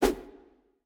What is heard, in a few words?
Whoosh; smite; izanami